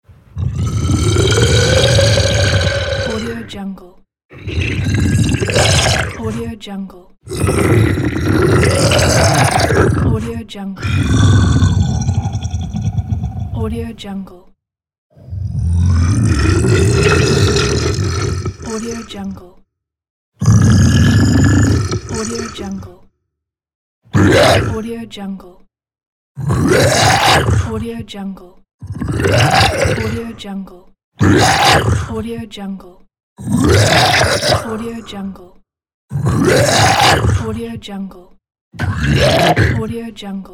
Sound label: giant monster